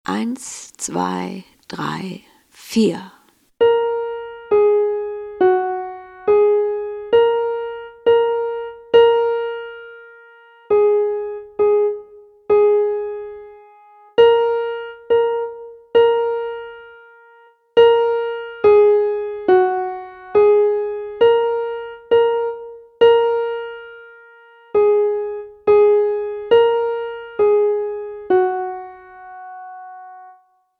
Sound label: Merryly ewe roll along slow